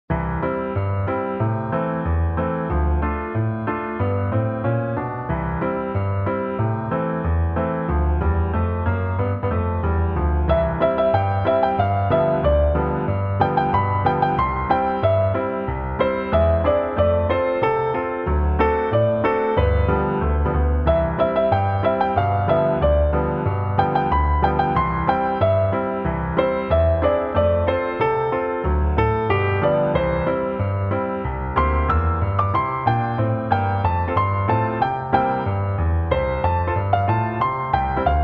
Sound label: Chilled Hawaiian Water